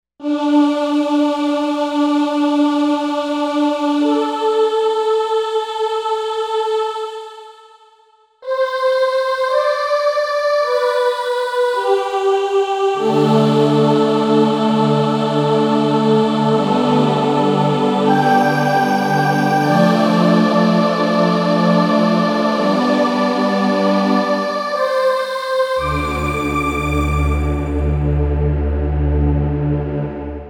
Super Voice

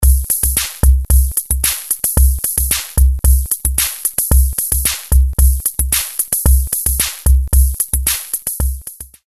Micro-Rhythmer, Hammerhead; Bram Bos

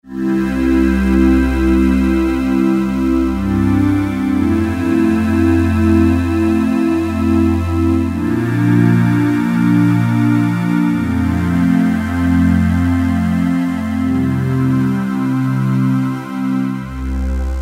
pad; pad-Fizzikal; E-mu Orbit V2

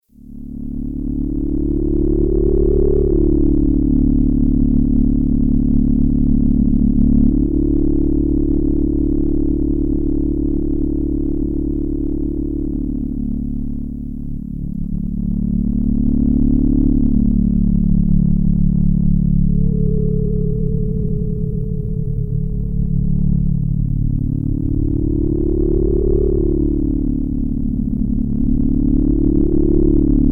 Korg DW-8000; Factory Patches; SLEEPING GIANT

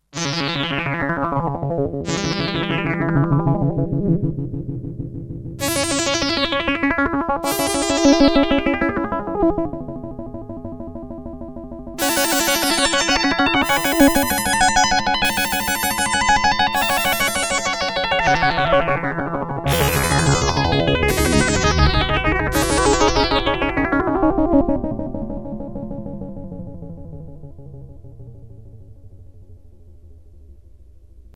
Square wave spacey, Sequential Circuits Six-Trak; Factory Programs

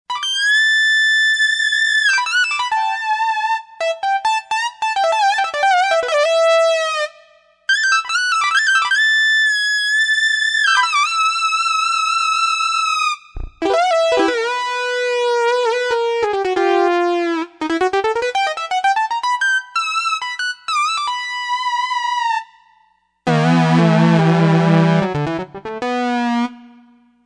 VCO Lead, Yamaha TG55; Factory Presets

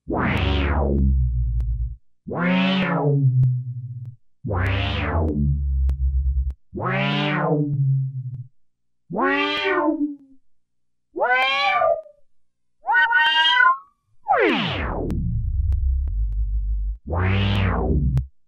Vocal Wow

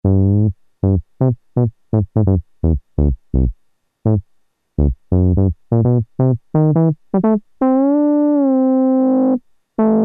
Trombone, Korg PolySix; Factory Presets Bank A

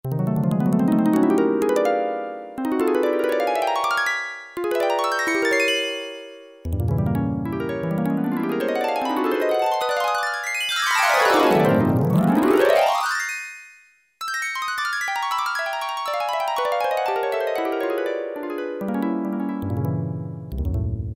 HARP ROLL, Casio VZ-10M; Internal factory combi

